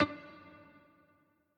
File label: piano riff intro